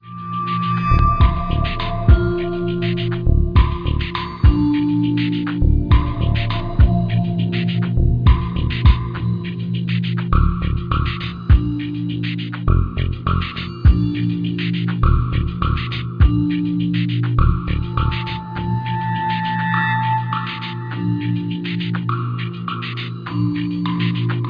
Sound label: Calm Loop; Safecracker The Ultimate Puzzle Challenge; NDS